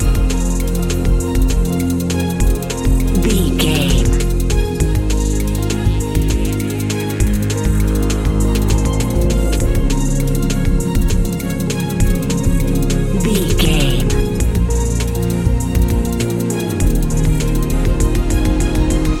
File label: inspiring new way loop